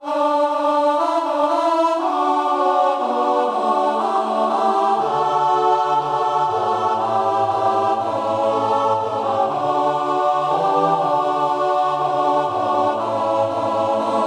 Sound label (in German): gemischter Chor dreistimmig